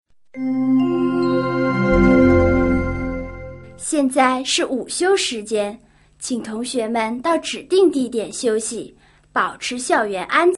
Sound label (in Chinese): 午休音乐